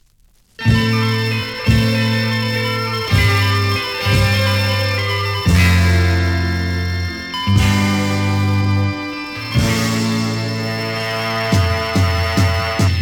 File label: blues pipe